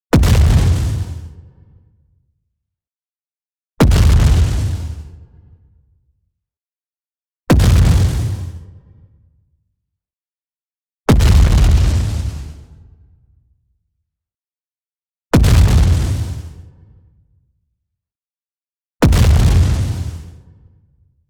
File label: EXPLOSION SHORT Booming White Noise Sine; boom library; Anime Essentials